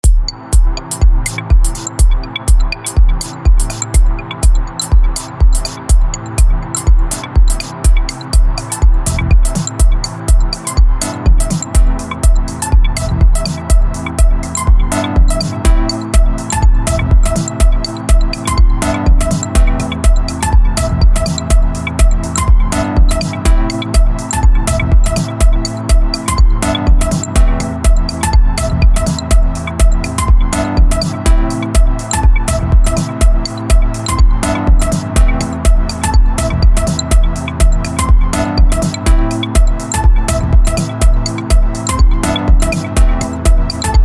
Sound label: Drum & Bass